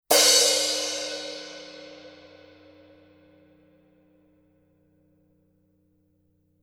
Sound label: Color Sound 900 Black Crash Paiste